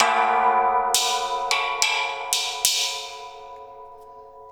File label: gas cylinder short